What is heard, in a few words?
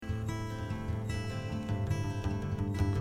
Uilleann Pipes, Uillean Pipe Set